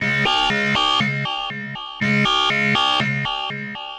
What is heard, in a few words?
Annoying, Trailer Alarms; Federico Soler Fernández; Effective Trailer Alarms